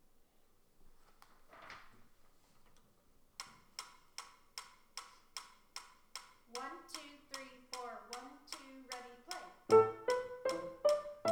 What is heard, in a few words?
Hall of the Mountain King Duet